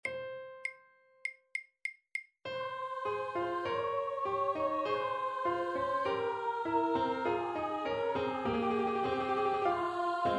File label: Amen Tenor Slower; 13. Amen (Tenor, slower)